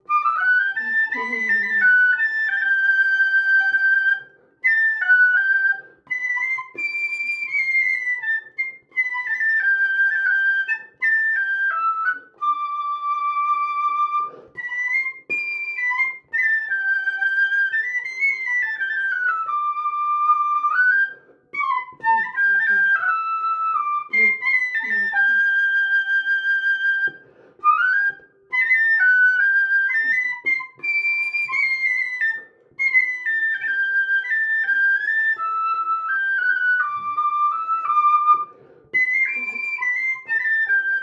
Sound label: Wind Instrument; Assassin's Creed Syndicate